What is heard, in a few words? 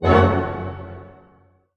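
F# minor pianissimo, orchestra hits; Sound On Sound - Peter Siedleczek's Orchestra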